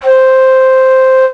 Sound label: Flute original; instrumente